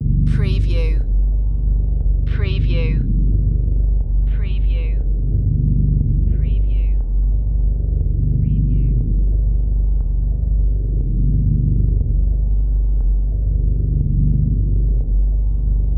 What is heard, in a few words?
Fazed Bass